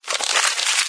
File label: crumple bag; There; ThereClient; treat